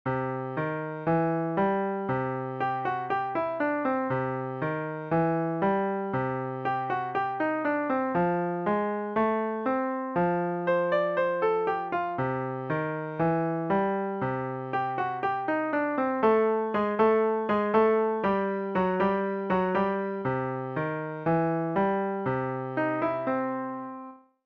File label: Blues, klavier